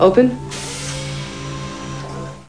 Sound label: open